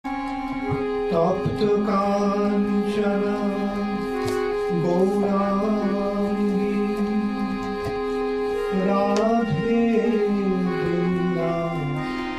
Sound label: Sri Radha Pranama Sung; HH Bhakti Caru Swami; Pranam Mantras